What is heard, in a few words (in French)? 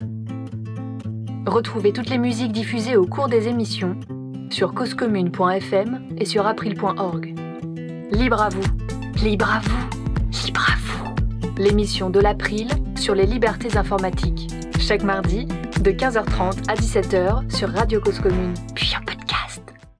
jingle pause musicale